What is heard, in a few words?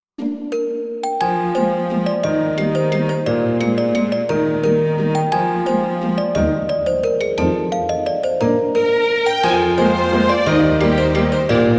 vals trombone plus; album in trombone